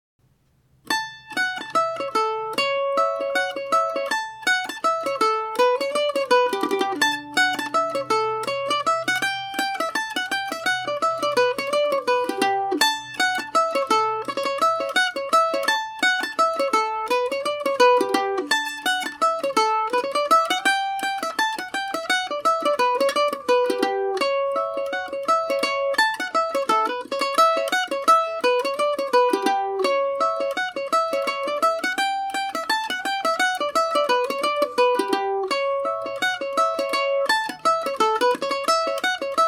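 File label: A mixolydian paris swing, irish mandolin; The High Reel; Paris Swing Macaferri mandolin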